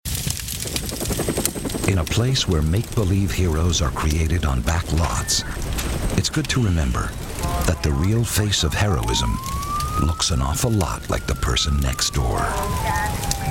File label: FIRE Sound